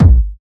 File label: short kick sound a sharp key